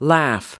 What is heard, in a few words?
LAUGH